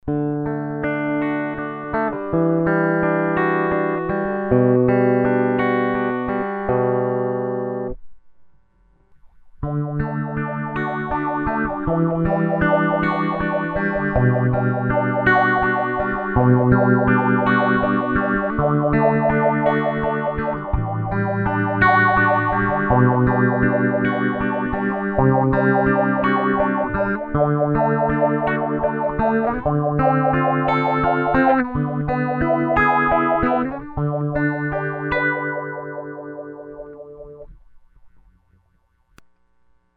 Electro-Harmonix Stereo PolyPhase

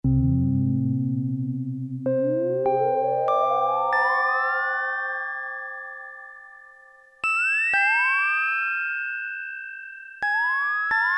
CHIME, Roland Jupiter-8; Factory patches

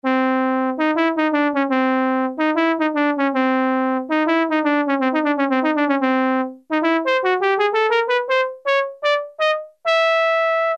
Trumpet, Roland Juno-106; Factory Preset

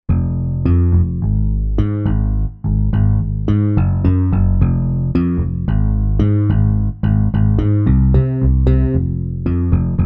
Xpress Bass

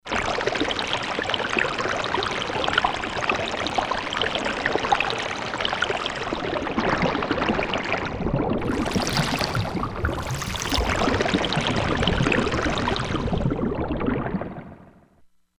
River C5, Korg MSC-16S; Environment

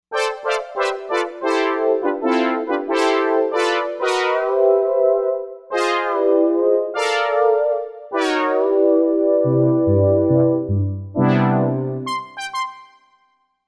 Ober Brass

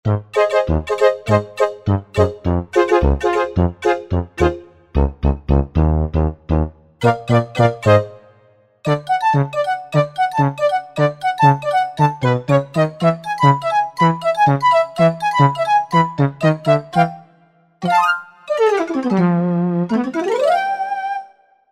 Tack Horns, Korg Wavestation A-D

